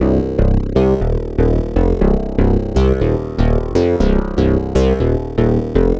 Mass Bass, Bass Foundations; Sonic Foundry (Sony Creative Software); The Electronic Music Manuscript Collection

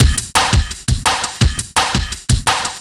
Classic Beat, Drum N Bass

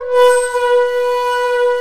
SHAKU B4, Asian Traditions; Soundscan vol.60; AKAI

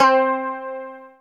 kyoto C3; Greytsounds; Scott Peers Sound Engineering

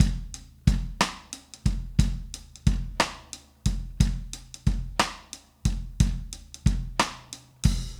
Drums, 70s Analog Funk

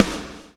BLOW; Spectrasonics - Backbeat

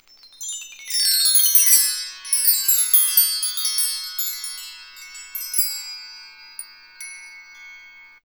XTRA PERC

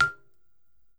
BALAFON, African Melodic

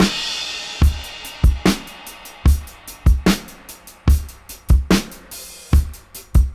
73, Dub, DRUM LOOPS 073 BPM; Drumdrops In Dub VOL-1

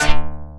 Trap Hit; Sonic Foundry (Sony Creative Software); Esoterik Beatz

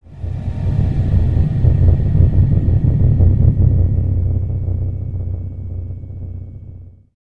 SPACE FX

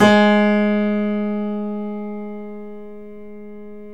KEY, Steinway ff

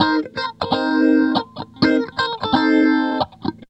GUITARS 130, House

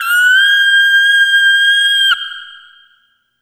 Arrhythmic G

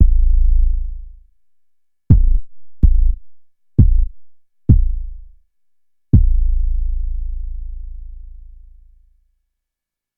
Kick High Quantity

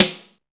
MIX SD, DRUM RYTHM; AKAI S6000 CD-ROM - Volume 1

